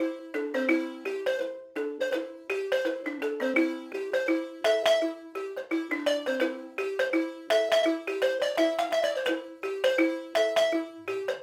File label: 84, balafone loop melodic percussion, C; The Gambia sessions; concept